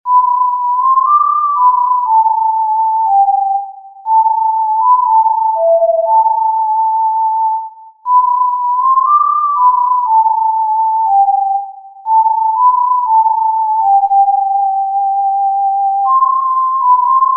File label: In the Bleak Midwinter In G-D Tin Whistle